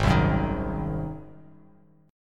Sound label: G sharp 7th G sharp, Bass Standard; G#7; strummed; JGuitar